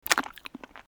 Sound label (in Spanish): come frijoles